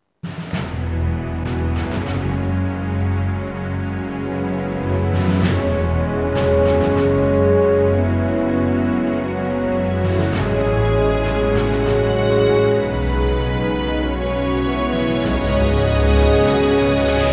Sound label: Ambient Map Variety